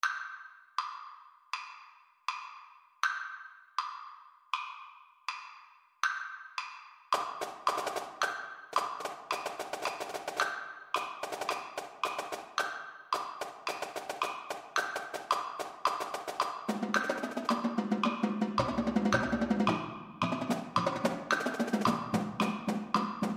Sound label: drumline only; prelude in c minor